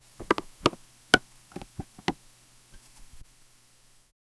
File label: create a sound effect of, household